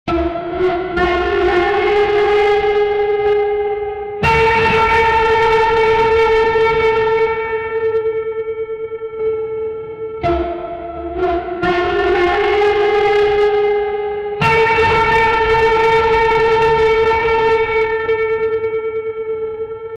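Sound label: a cliffhanger theme horror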